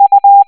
u tone

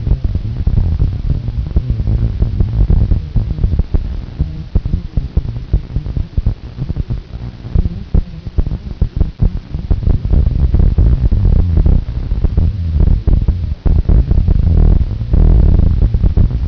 rumble; icom; 756pro

